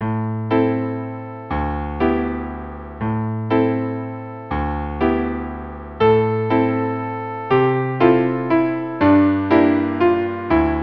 gymnopedie no 3 violin and piano

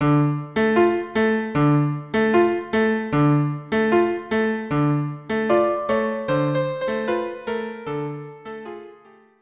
habanera violin viola; carmen

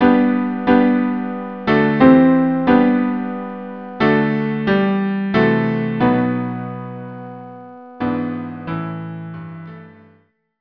cello and piano; Morning Prayer

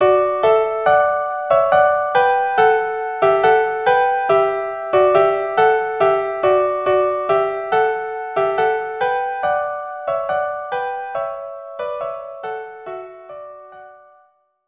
violin violin violin viola; La Bergera (A l'umbreta del bussun) violin violin or violin viola duo

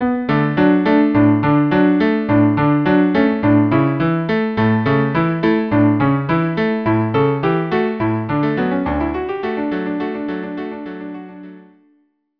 Dreidel song viola cello

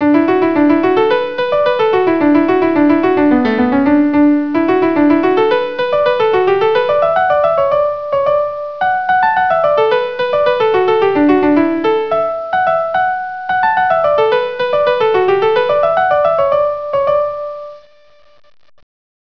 Hornpipe

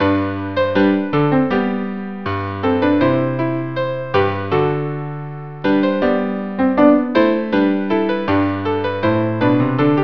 ballet trio; Praetorius

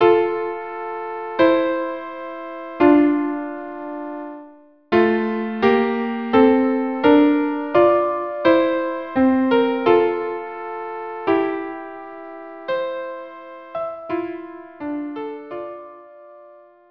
March; of Priests; Magic Flute

